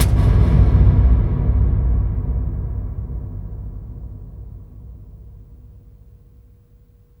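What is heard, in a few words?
CAVERN, AMBIENT DRUMS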